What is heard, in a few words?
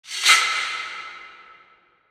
designer high scrape hit; SoundsCrate